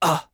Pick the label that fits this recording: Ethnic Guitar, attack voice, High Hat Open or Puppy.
attack voice